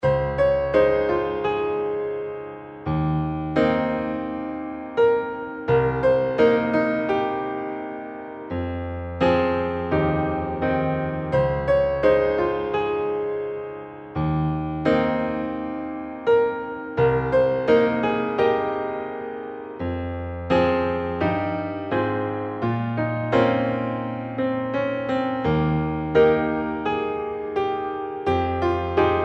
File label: bgm